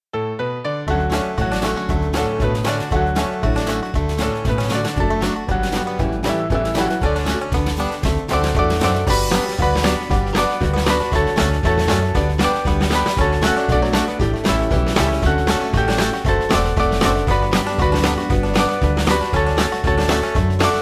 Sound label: spiritual band